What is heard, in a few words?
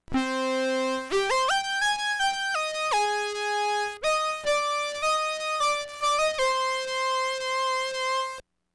moog lp two